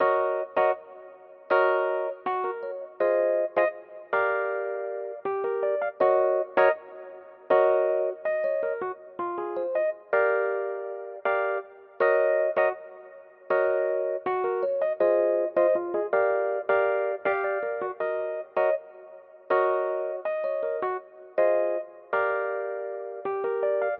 160 electric piano pretty; KEYS ORGAN PIANO LOOPS

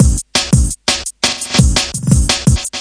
170 basic wanna roll it, drum loop; 161 to 180 bpm

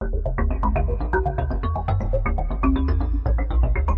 blobbly synth 16ther; SYNTH AND ELECTRONIC LOOPS

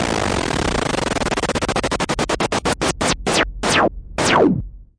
noise Modular, Noise Sweeps

